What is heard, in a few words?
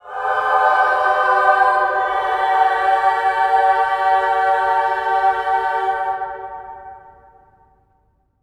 amen; Spectrasonics; Symphony of Voices; BC AMENS